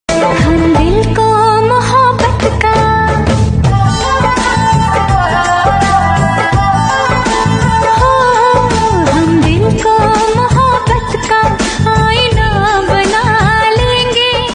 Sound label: Ringtone